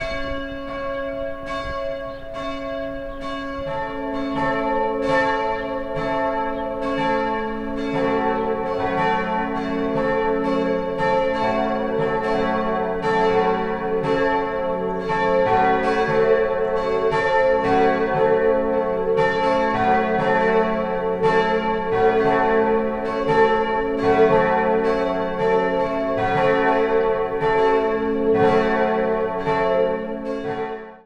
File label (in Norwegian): Kyrkjeklokker